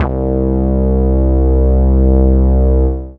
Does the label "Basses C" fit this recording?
Yes